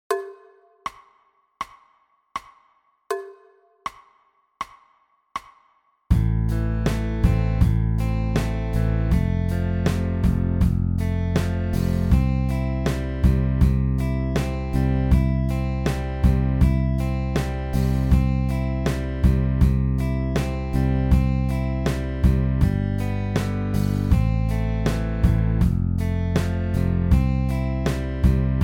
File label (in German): Solo 80; Play-A-Longs